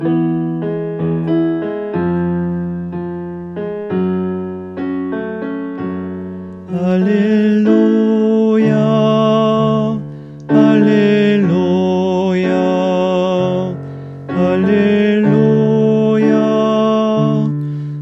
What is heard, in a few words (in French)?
Refrain Voix